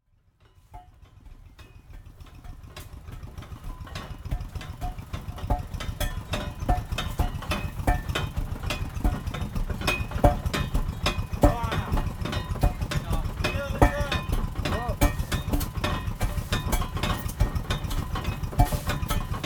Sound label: Stone breaker, INDONESIA